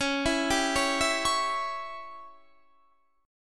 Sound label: Db Diminished Db down Guitar Standard; arpeggiated; JGuitar